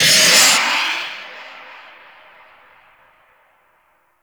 Infested Devourer Retract Vocal; Warframe

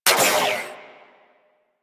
Shot, Weapons; Warframe; Tenno; Miter

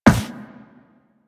Run Metal, Footsteps; Warframe; Operator

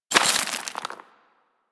Bullet Impact Debris Rock; Warframe; Weapons

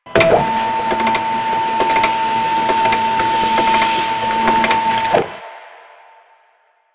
Office Fax Machine Misc; Ambience; Props; Warframe; Nineteen Ninety Nine

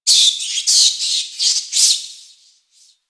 Forest Distant Medium Creature Small Cranky; Warframe; Grineer Forest

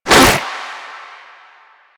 Arm Attack; Enemies; Warframe; Proto Infested; Runner

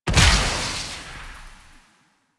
Gas Arrow Explosion, Weapons; Warframe; Infested; Derelict Cernos Prime

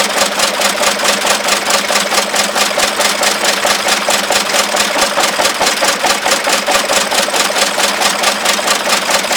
Settlement Small Machine Loop

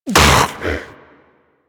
Predator Vocal Tranq Dart Grunt; Warframe; Hunting Critters

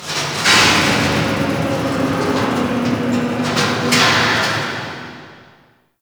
Ocean Conveyor; Warframe; Grineer Ocean

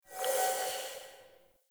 Inorganic Pickup; Objects; Pickups

